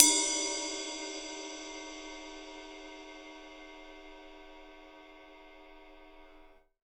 RIDE22 EDGE; Big Fish Audio; Ross Garfield Drums VOL-2

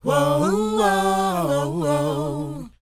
WHOA D, Vocal R&B & Dance; ILIO; Vocal Planet

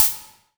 snare mod; snares

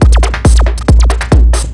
drm 138, Techno, drum-loops; Techno ID; modular-fiction

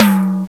TAMBOUR, FAIRLIGHT CMI IIX; PERCUSN2

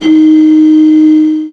flt ds4, MIRAGE Bell + Flute; ProSamples vol.55 - Retro Sampler

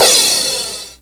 PROCYMB, CYMBALS

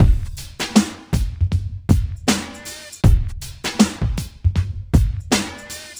Street Tip, R&B Drums

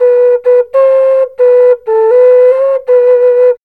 SERULING, Heart of Asia

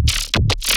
weird bass

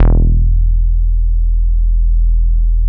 FONKIN BASS, Bass Analog & Synth; USB Soundscan vol.12

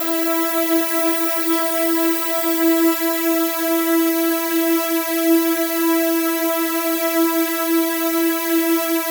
SIZZLE E5, SIZZLEPAD; Drums In Your FACE!

